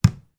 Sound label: satsuma drop on worktop